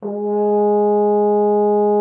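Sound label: French horn G#3; Xsample VOL-3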